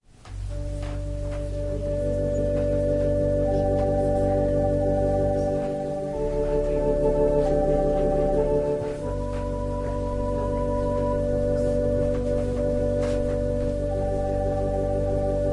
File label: Organ prelude